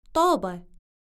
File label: tuba